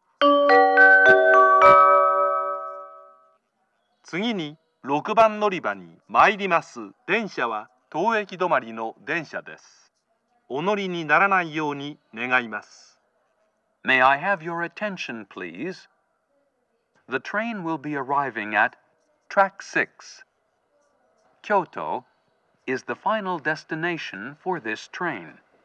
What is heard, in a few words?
stop, kyoto, station